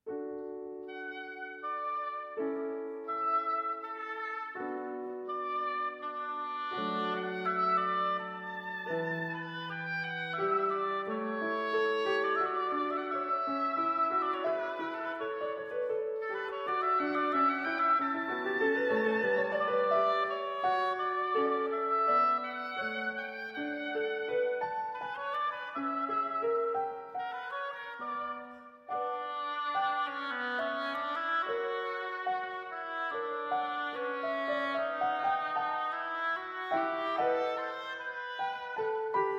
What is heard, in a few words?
Oboe Sonata in D Major I. Andantino